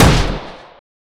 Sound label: Shot Gun; FREE SFX PACK VOL 2